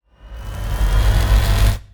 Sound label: spawner used